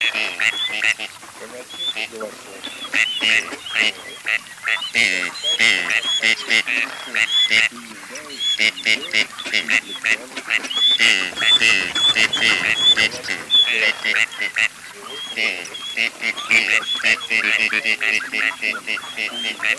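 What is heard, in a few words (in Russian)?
Утка Серая Canapiglia, манок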